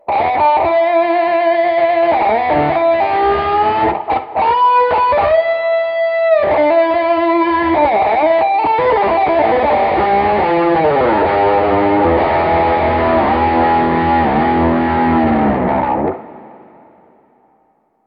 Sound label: strat lead